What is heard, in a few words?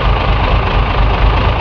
diesel; Millennium Gold 2000; Monster